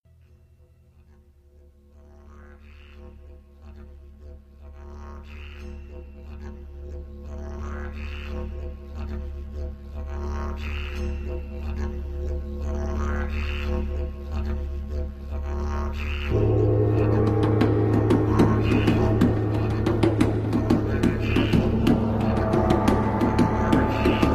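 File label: Didjeridu And Percussion Interlude; Jeanette Wrate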